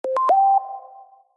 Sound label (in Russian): krasivoe melodichnoe zvuchanie